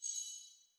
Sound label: shimmer Click